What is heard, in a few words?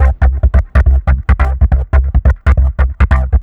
Trance Bassloop 140bpm, Bass Arps